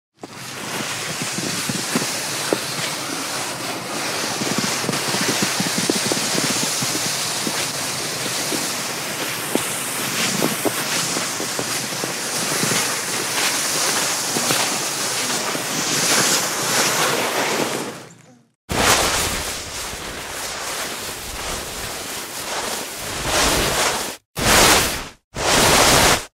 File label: sled